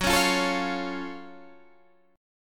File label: Gb Minor Major 7th Gb down Guitar Open D